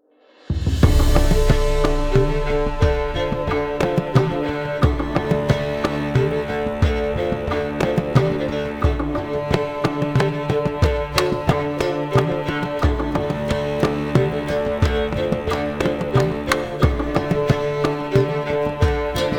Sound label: tavern; Novigrad